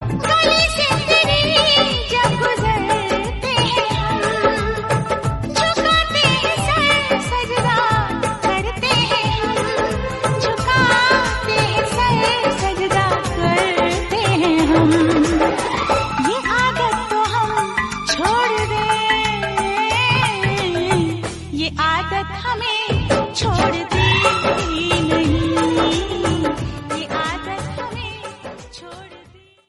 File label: Hindi